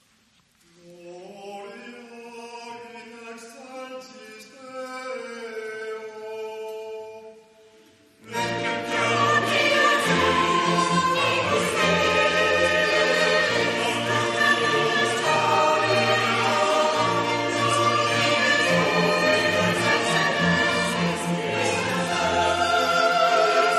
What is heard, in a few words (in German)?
gloria, chorgemeinschaft, kirchenmusik; brigittenfest